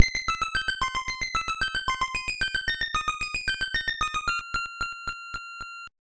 Fairy Appears; Esper Dream 2; nes

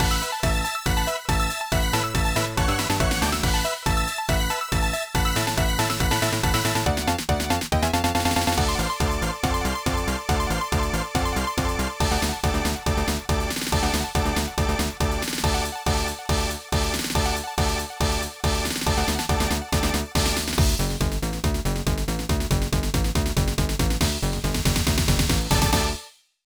BGM Mini Game Cheer

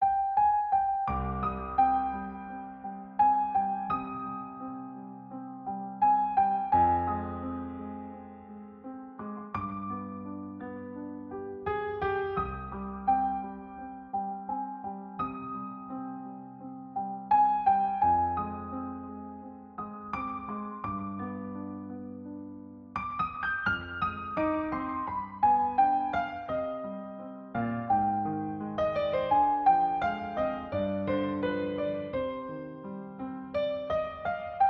Dramatic Piano; bakemono demon brigade tenmen unit 01

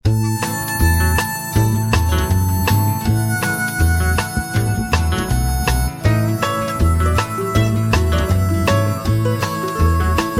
Western Music; Doki Doki Universe; PS Vita, PS3, PS4

